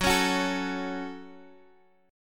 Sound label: Gb Minor, Guitar, DADGAD, chord; strummed